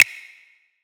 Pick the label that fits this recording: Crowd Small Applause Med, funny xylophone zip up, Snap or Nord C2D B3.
Snap